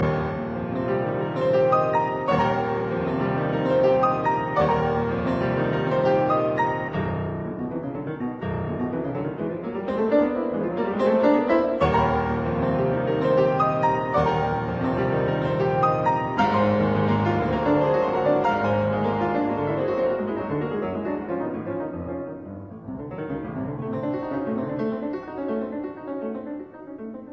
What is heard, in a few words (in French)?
Etudes Pour les octaves; No 5